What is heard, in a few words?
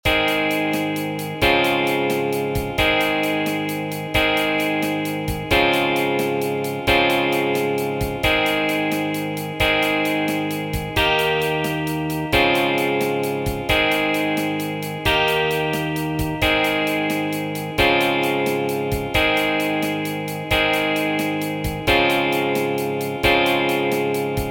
6 8 Blues No Bass, Tryout track; 2027 MMEA conference All-State Collective Ensemble audition